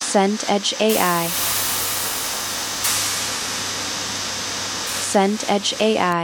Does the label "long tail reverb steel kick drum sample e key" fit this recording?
No